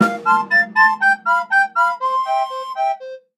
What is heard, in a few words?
Gameover; Smartball; Dillon's Dead-Heat Breakers; Nintendo 3DS